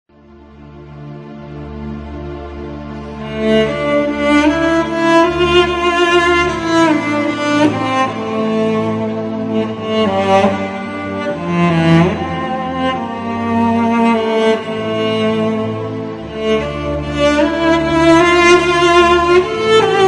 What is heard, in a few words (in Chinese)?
伤感的背景音乐; 3分以内